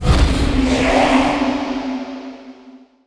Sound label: Reaper disappear; MUDevs S20.1-3